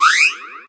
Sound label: Ice